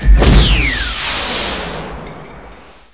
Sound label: Thump!; Chris Marker - Immemory; DTS